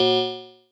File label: marimba; ゆめ2っき